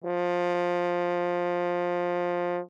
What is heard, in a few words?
Horn ff F3 stereo; Brass; MIS Pitches - 2014